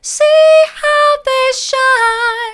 mid, vocal

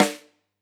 close Rim, drum

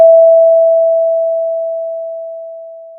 Sound of a doorbell

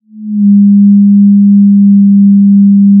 pop, Sound of a cruise ship horn

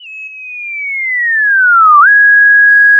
Sound of a police car siren

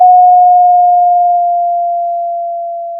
pop, Sound of a school bell

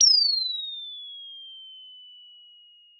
Sound of an owl

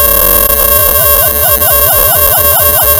pop, sound of a bike bell

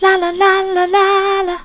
la la soft, vocal

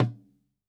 Tom, drum

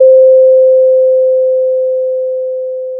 pop, Sound of a doorbell

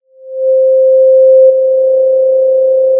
Sound of a cruise ship horn

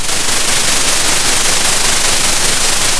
pop, Sound of a thunderstorm

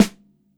Snr, drum

